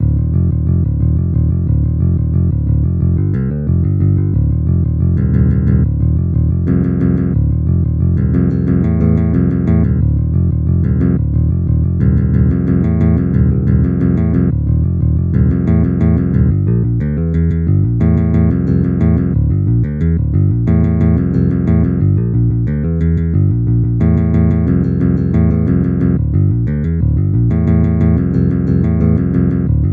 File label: punk bass